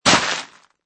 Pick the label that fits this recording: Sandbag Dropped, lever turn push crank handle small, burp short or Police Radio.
Sandbag Dropped